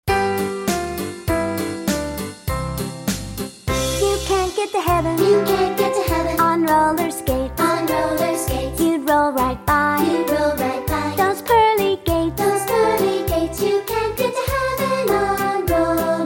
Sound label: Childrens Choir